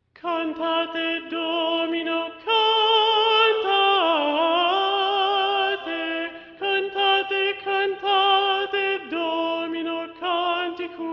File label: Alto; Cantate Domino